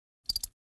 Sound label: bug legs vibrate